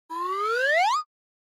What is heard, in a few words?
Slide Whistle Dry